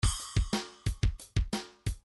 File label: shuffle 1 bar